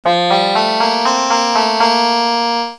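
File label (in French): cithare; MOBICLIC 4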